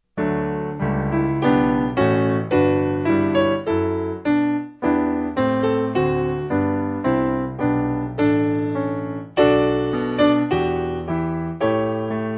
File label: piano